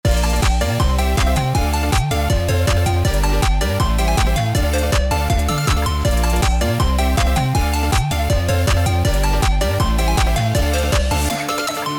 Triad

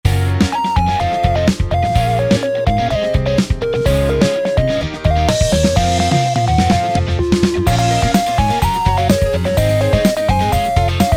Drums Accent

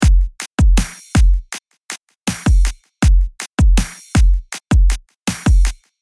drum

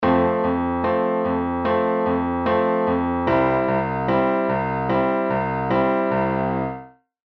piano

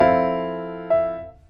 Fmaj7 part

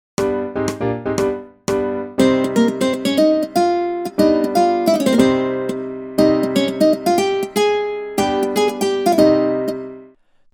scale

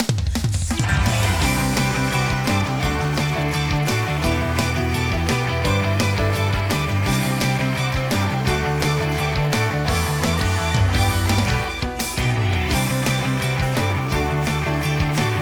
song off vocal

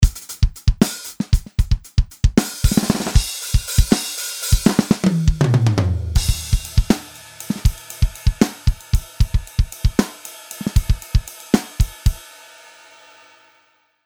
Dist off

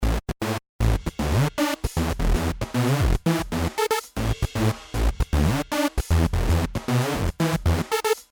bass ghost

